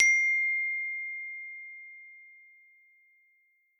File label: sms alert note; i OS; iOS10